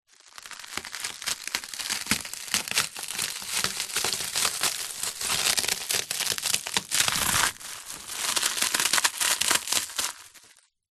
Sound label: food crispbread squash crush on wood